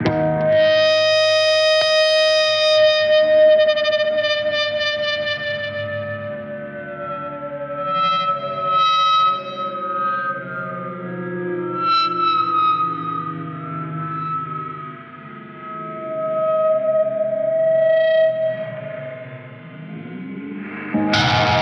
Random Guitar Feedback

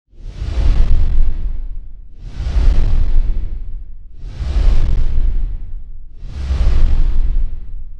Dragon Wings

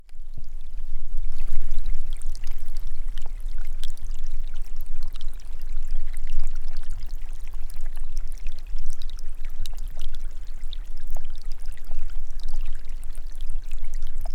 Snow Melting

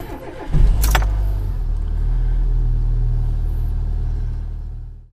Car Start

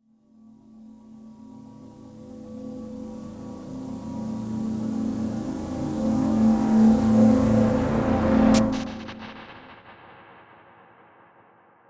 Ignition

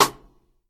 Rim

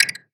Collect

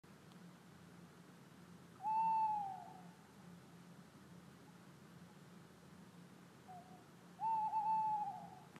Tawny Owl In Molkom Sweden

